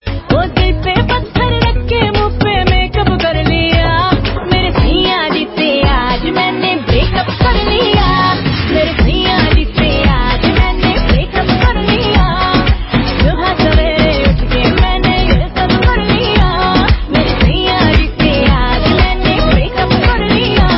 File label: break up ringtone